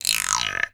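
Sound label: small bamboo guiro; Voice Crystal - Steve Reid Definitive Percussion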